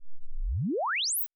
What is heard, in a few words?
frequency sweep left -20 dB